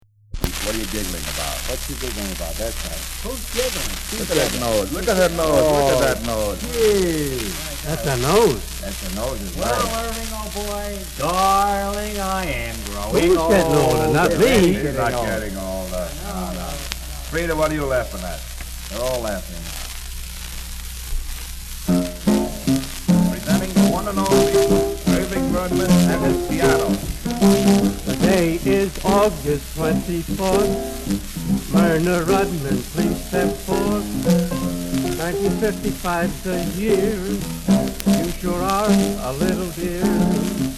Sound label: grandpa birthday song